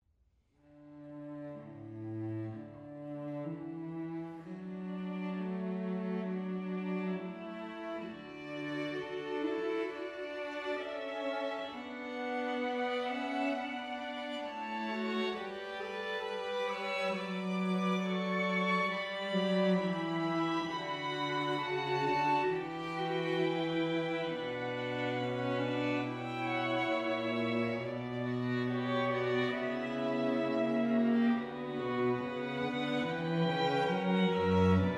canon; solokov

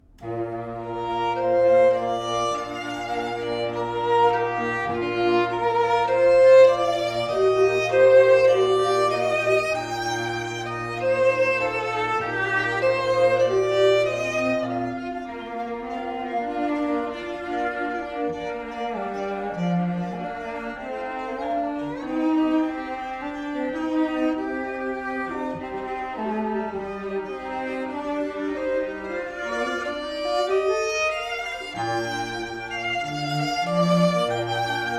trio

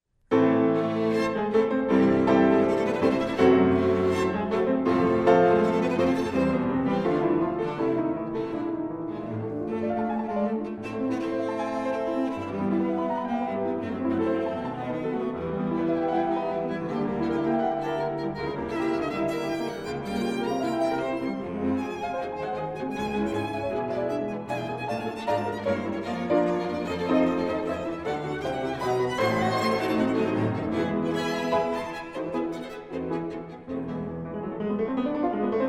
piano quintet; foote

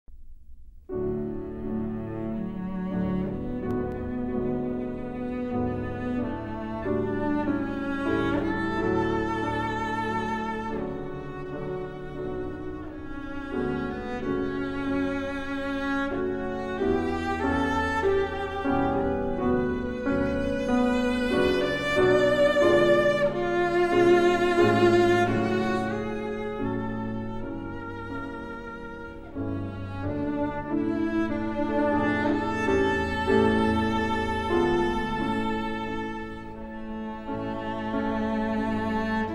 trio; foote

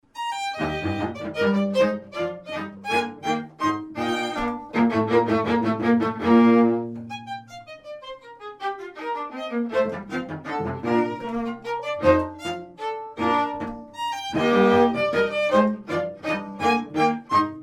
trio

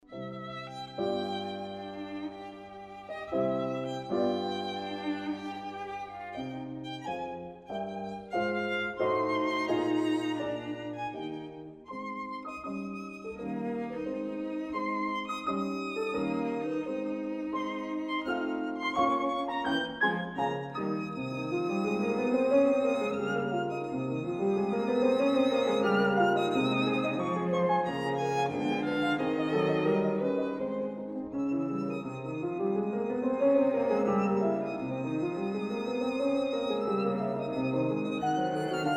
piano trio; marschner

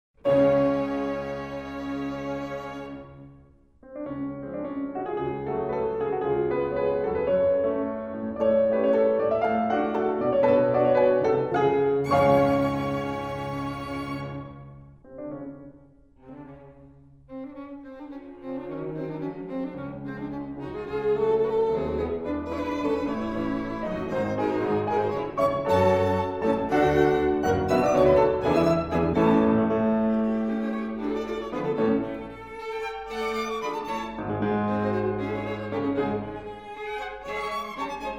piano qnt; kiel

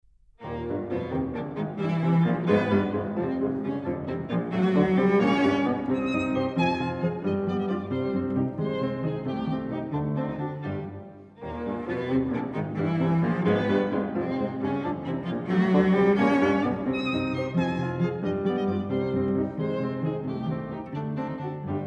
piano trio; herzogenberg

